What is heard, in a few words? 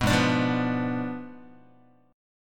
Ab Diminished 7th Ab, Guitar, 4A, 4E, 5A, 5D, 5G sharp, 6C sharp; Abdim7 strummed; JGuitar